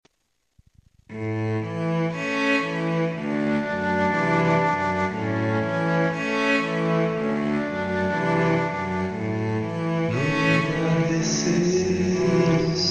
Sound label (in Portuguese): violongo; teatro de astra